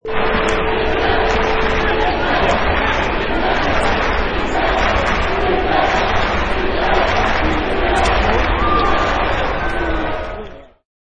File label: Arena Crowd Cheer